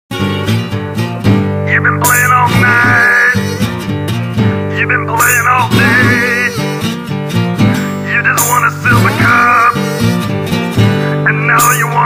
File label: Blues; Total Distortion